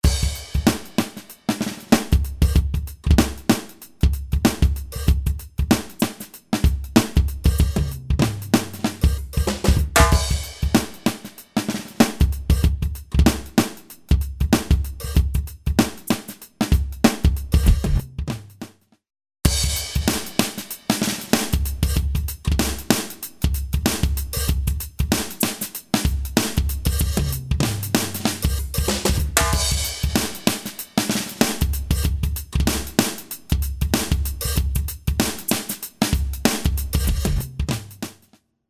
Drum Groove C

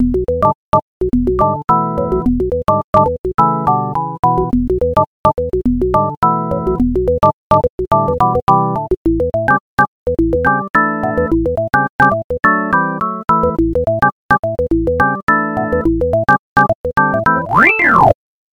Percussive Organ

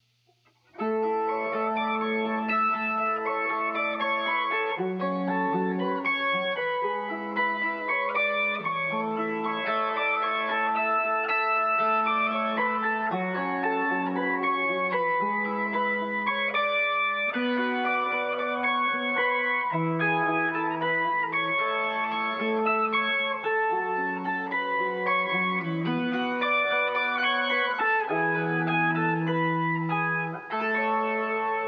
guitar with upper octave organ sustain

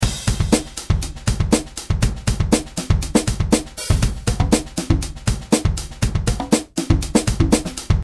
A Big Kit

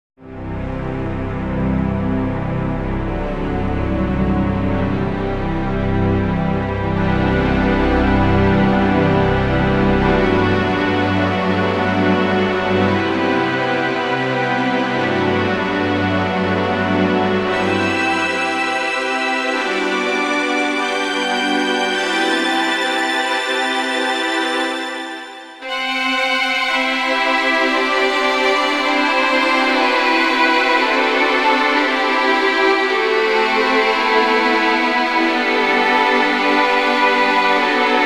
Symph Str

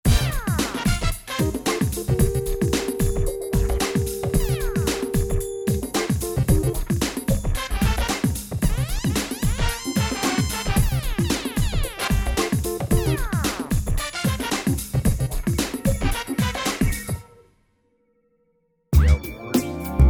CRUNK

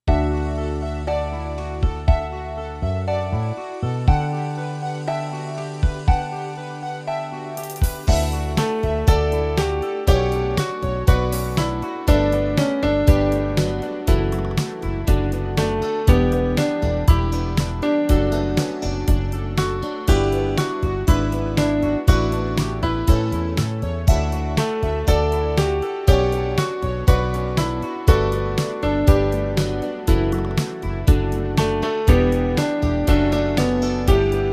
Piano

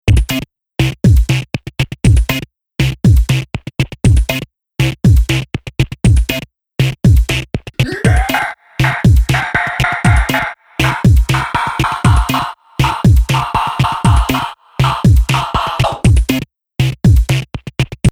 Processed Tech Screamer

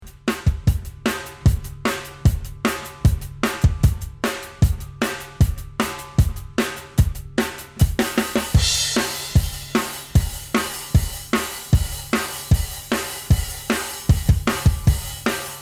Drums heavy Comp

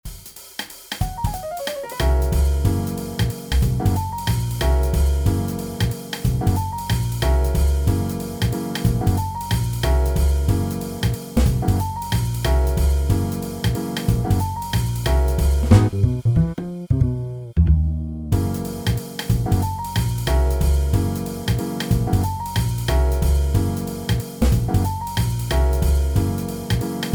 Bump

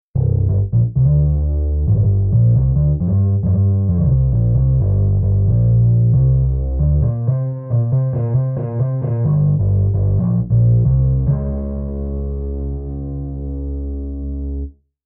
furry little bass

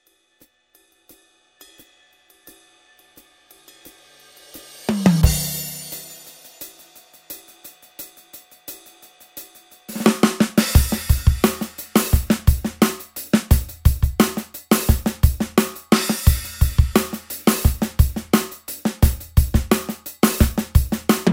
pop drums only

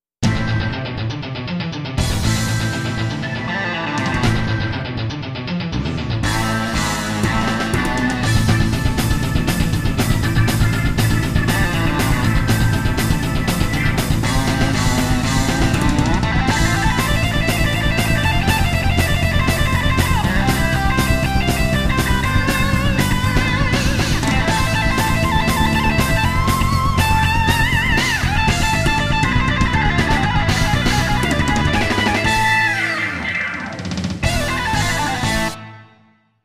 metal

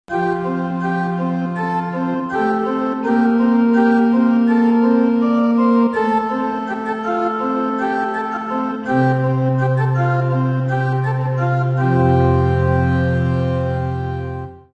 Organ

